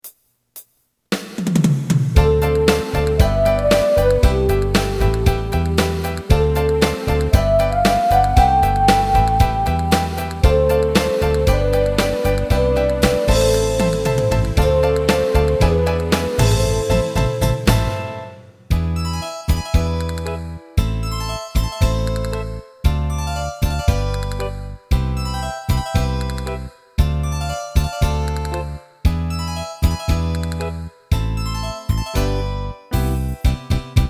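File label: karaoke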